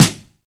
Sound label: piercing steel snare drum sample D key, snare single hit